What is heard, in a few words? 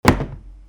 FALLING